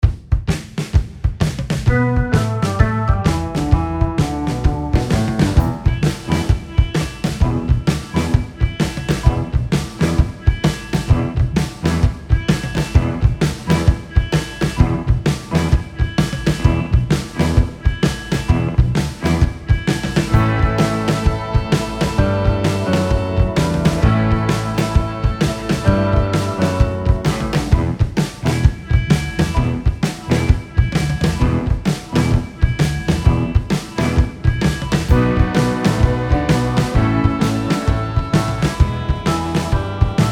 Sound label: BLUES INSTRUMENTAl; Commercial